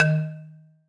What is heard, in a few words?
marimba